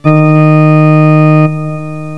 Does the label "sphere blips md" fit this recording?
No